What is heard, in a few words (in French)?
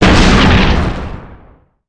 explosion; impact